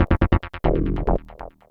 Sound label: SNTH BASS ELCTR 140 A, Techno